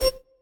slot random; Vex 7

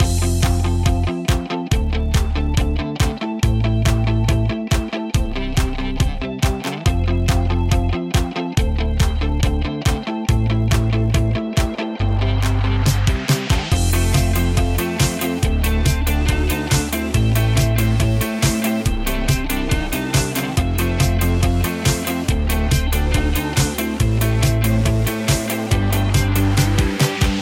bgm; drift-boss

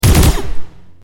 lasers; crossyroad; dinosaurs; parasaurolophus

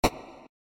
note Erase; Friday Night Funkin; charting Sounds

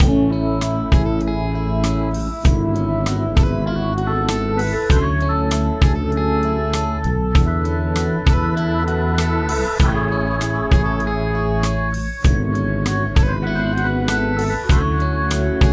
bgm; stickmangolf

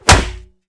Bonk; fridaynightfunkin; weekend1; Pico

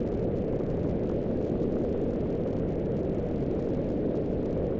event hut fire; adarkroom

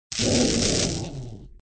Dog Shock; Bob the Robber 2

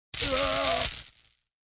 Enemy Shock; bobtherobber2

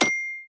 tab sounds; Thirty Dollar Website

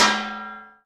pan; Thirty Dollar Website

